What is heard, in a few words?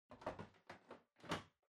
Air Purifier Far Tank Insert